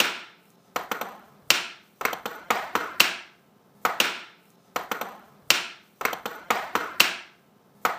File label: percussion loop strikes 120